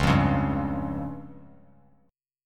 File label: Db Major Flat 5th, Bass Standard; strummed; JGuitar